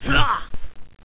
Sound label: miss; cstrike